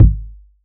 dope bass drum single shot G sharp key, steel kick drum sound tuned to the G# note